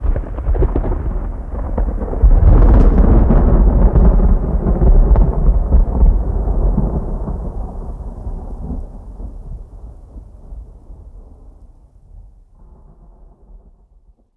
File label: Distant Strike